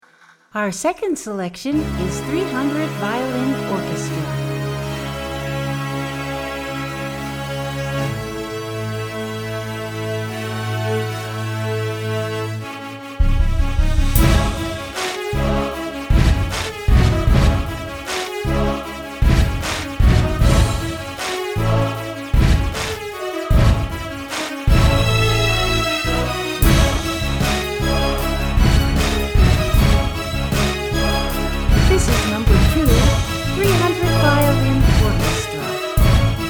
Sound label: violin orchestra